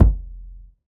bassy bass drum single shot f key; Duration 0:01; kick drum samples

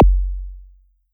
ambient bass drum one shot F# key

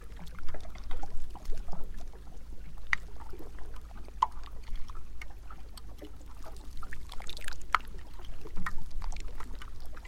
big iceberg drifting in ocean

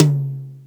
reverb tom drum one shot c sharp key

